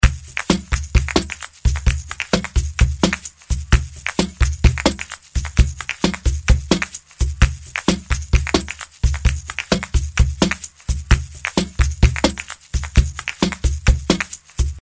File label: cajon